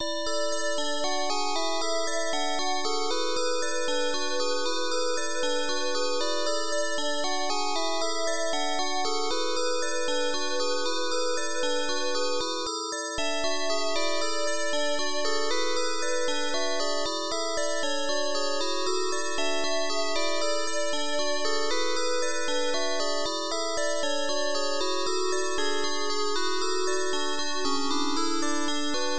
Cave; Digan no Maseki; PC-98

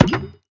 Pack Card Pop; Golf Blitz 1.12

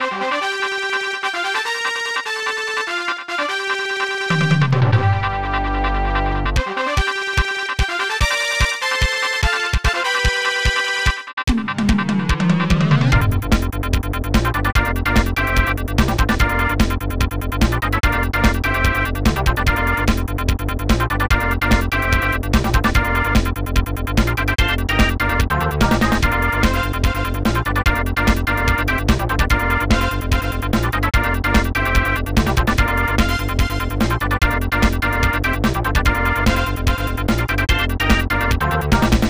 Pause Menu; NFL Quarterback Club 96; SNES

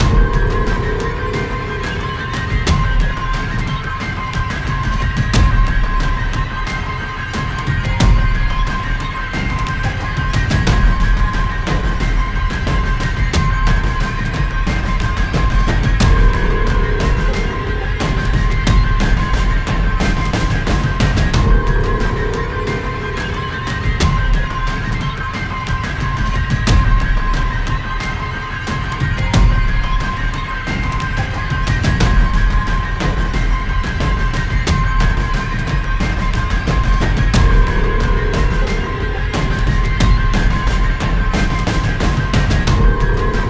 End Loop, gamerip; dying light; Slums Storyline Miss Demolition

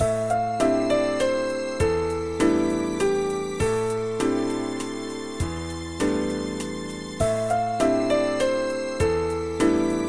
Grim Piano

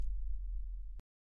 Mus Village Build 120; from dust

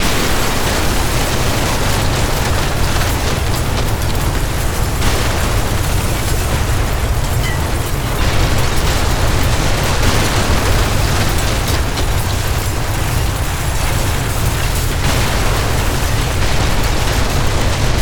BOULDER SMASH

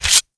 Shield

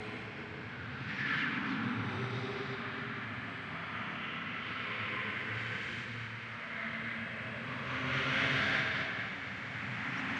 menu walla loop; Hearthstone; Naxx

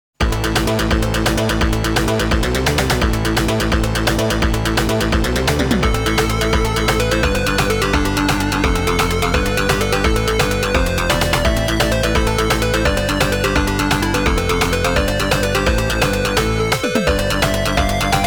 Underground Cave; Perfect Collection Dragon Slayer The Legend of Heroes II

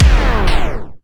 DJ Disco Stop; Plants vs. Zombies Battle for Neighborville

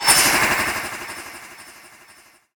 Transition Metallic; bottlerocket

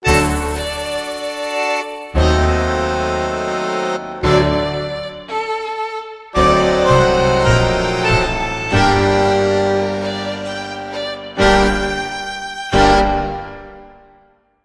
Tango Sequence; mass effect 3 citadel gamerip 2013; Silversun Strip

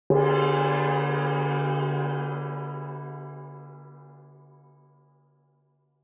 Gong

